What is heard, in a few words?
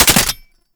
Belt Fed Rifle Mech B; Warframe; Tenno; Frumentarius